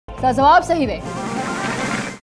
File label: correct